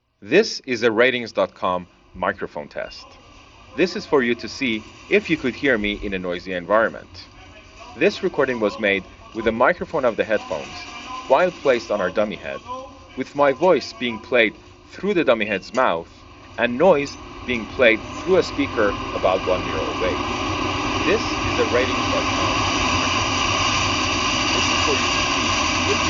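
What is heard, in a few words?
Bluetooth PC subway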